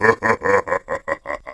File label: Laugh; TR3; Shiva